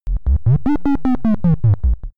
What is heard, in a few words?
digital signal